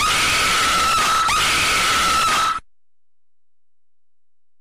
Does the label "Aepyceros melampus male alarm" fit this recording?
No